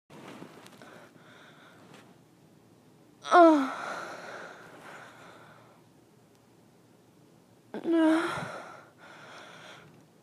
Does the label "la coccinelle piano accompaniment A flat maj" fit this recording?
No